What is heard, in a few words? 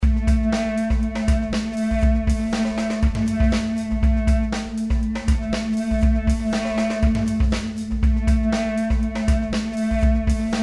ebow song